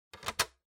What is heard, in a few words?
Optical Disk Drive Insert